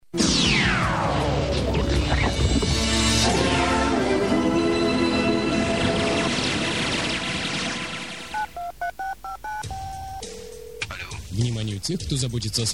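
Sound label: jingle reklama, advertising jingle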